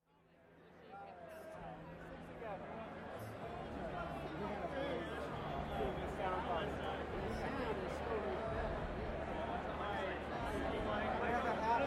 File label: audience